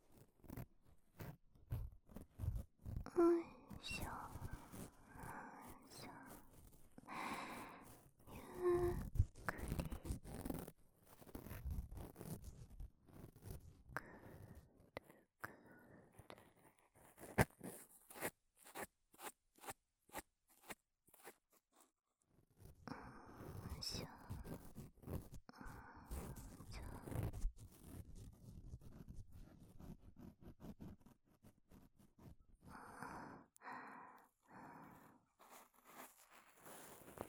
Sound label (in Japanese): 安眠 右耳 耳かき